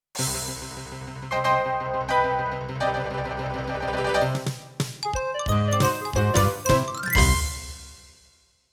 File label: CM500 GS; Larry 3 passionate patti in pursuit of the pulsating pectorals